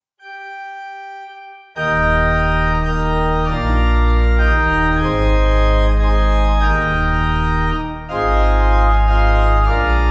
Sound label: Sanctus, Pipe; Holy Holy Holy God of Power and might; Schubert; Hymnal 1982